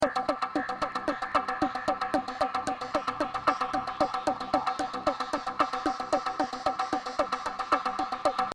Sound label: loop